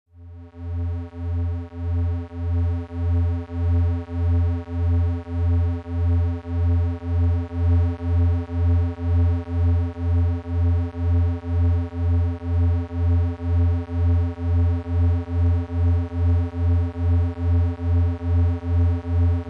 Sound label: Low electric hum